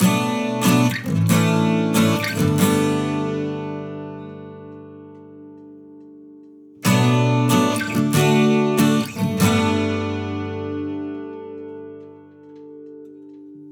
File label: Acoustic Guitar Loop - 140BPM - Bmin; Leads; Ghosthack